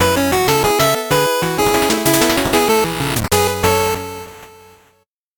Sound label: 8bit jingle slow